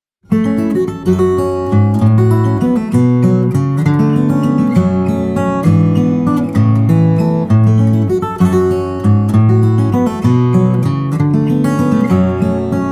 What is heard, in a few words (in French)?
Valse Chinoise